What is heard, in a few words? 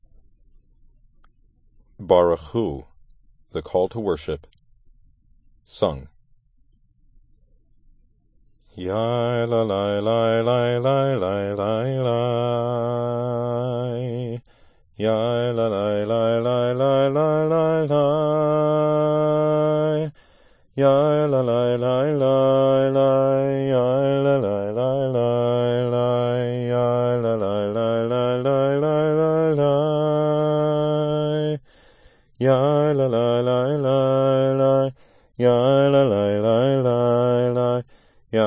Barchu sung